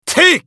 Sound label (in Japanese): 攻撃短; エデュアルド